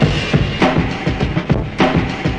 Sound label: 101 bpm, big clanging deep, drum loops and breaks